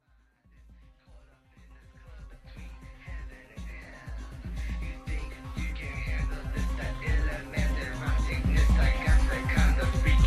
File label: sex trance mix